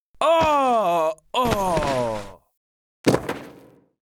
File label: die, Mob; Psyper